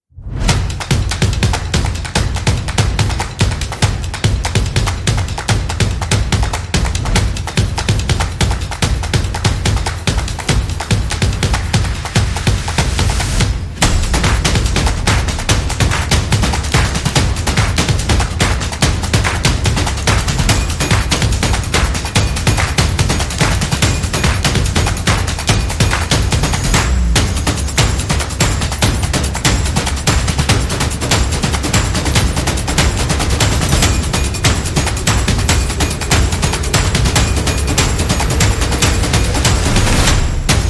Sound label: drive sport percussion drums; action drums percussion